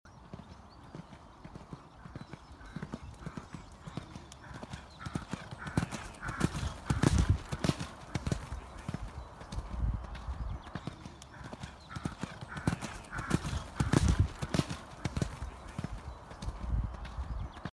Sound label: horse audio; Staffordshire Museum